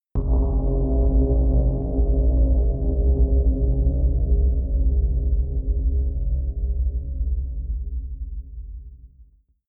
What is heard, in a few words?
synth hit sub space; whooshes rises